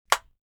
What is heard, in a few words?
cell phone flip